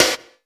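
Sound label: snare drum, Drum & Bass, 155 BPM; Ueberschall; Drum & Bass Resonance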